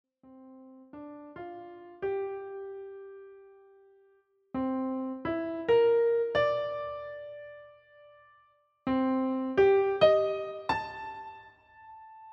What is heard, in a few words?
Variations for two pianos; Gershwin